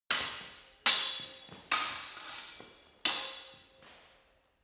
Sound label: sword hit fast sword freestyle